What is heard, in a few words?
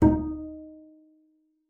D#4, bass pizz; Xsample VOL-5